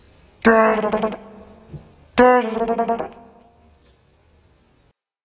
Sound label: Pterodactyl, Dinosaur Roars